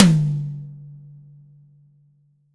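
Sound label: TY High Tom, Roland BK-5 Toms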